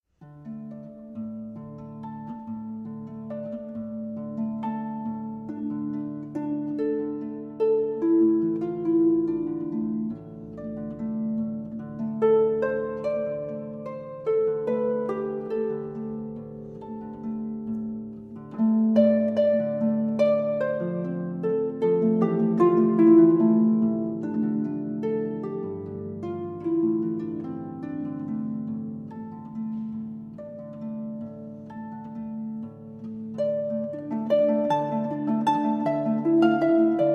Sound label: Scarborough Fair English Traditional